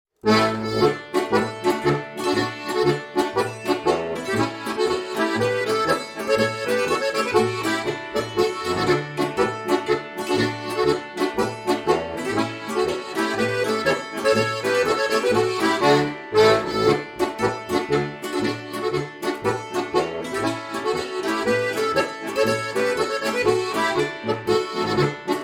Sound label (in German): Polka